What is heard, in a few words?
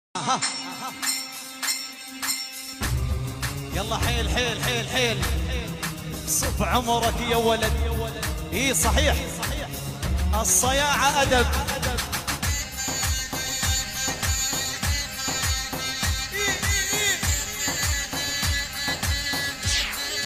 Arabian song; saudi arabia; dubai